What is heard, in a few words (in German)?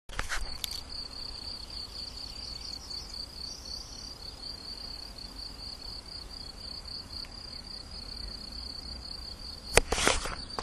Feldgrille, Rufe